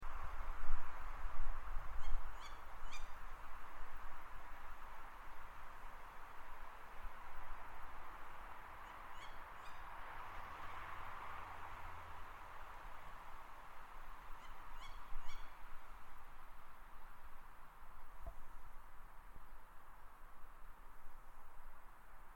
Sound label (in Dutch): Bosuil; broedvogels